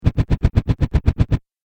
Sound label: Game Helicopter Blades; Video Game Sound Effects